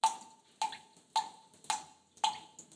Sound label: Ambience water drips